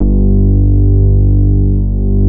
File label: warm bass G2; SE-1 BASSES